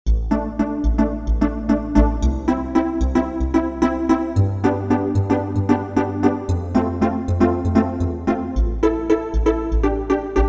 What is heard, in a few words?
Roland JV-880; Factory Patches; Pizza Hutt